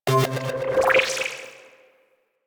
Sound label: UI Invite Received; Warframe